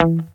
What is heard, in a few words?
Synth Shots; Vengeance Samples